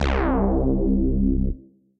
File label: Saw Reese, Bass; Ableton; Skitter and Step